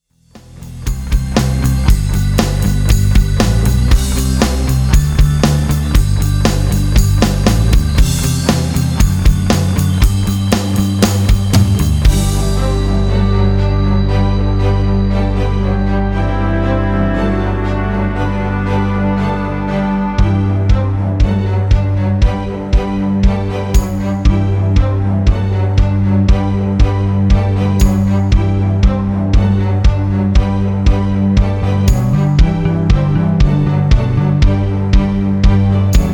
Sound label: rhythm